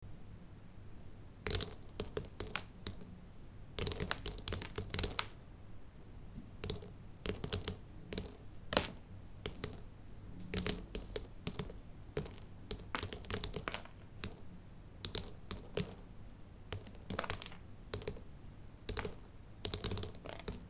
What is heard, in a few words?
dropping particles